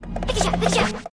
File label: Piggy Trot